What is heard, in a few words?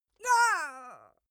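female death, screams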